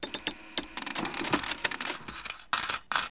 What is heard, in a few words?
cash